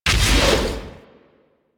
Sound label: Disappear Whoosh; Enemies; Warframe; Infested; ZealoidPrelate